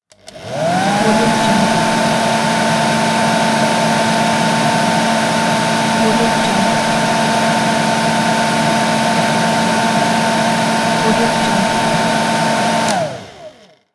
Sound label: hair dryer; hypersonics